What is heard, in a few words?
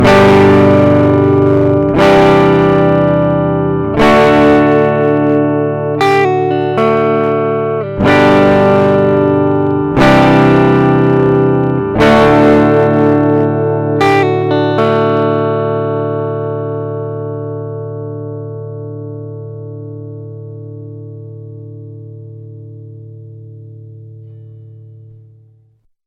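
UA710 guitar G12; Universal Audio 710 Testbericht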